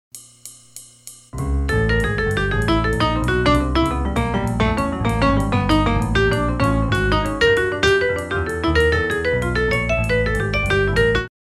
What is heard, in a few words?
8 bars; tp aratori vol 1